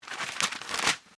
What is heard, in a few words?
swoosh